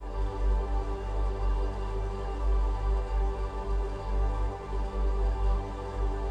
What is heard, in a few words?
pad, G; M8